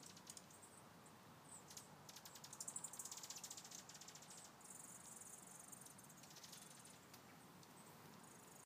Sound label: Dark Eyed Junco